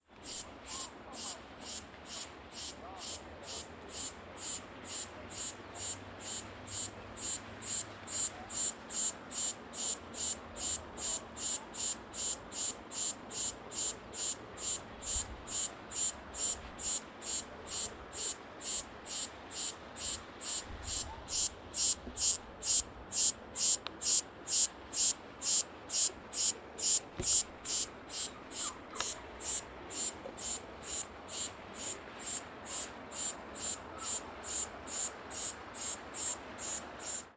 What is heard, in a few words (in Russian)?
cikada kak v parke